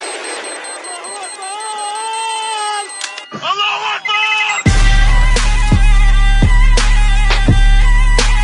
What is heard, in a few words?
allahu akbar trap remix